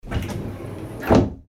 door sliding close

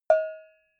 cowbell hit soft

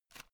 headphone extend single short

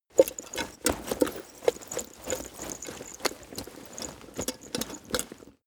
leather metal movement hard

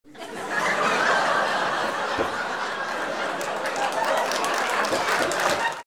laugh applause

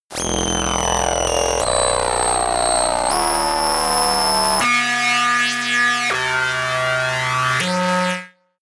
screaming synth sequence long

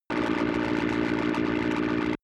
rusty machine room

